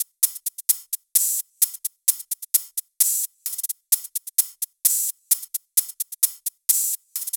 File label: 130BPM Kit; VFH1 130BPM Tibet Kit